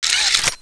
plasma gun clip in

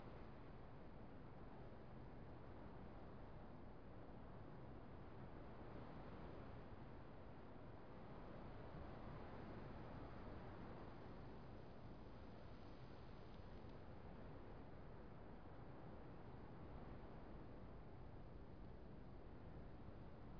doors; czero; soundscapes; de dust2

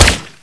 uzi fire

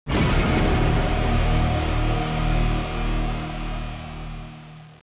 deathcam, musickits; musickits, CT; csgom; cstrike

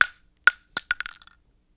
shell

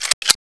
silencer off, weapons m4a1; mw2

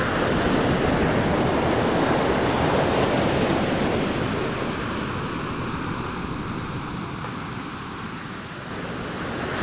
sea; czero

